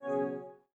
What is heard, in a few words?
Menu Escape; Custom UI Sounds; Playstation 4